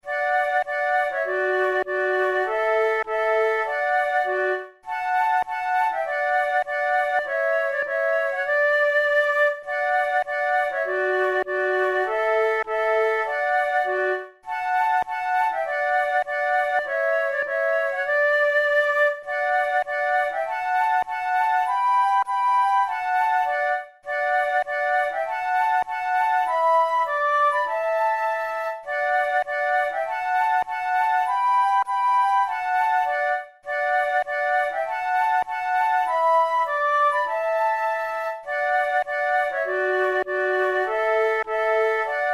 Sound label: duet, flute